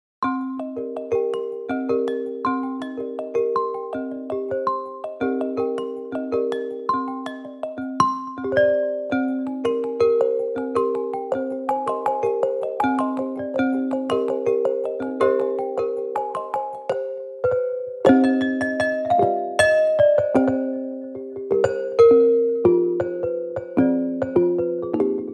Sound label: grand glass marimba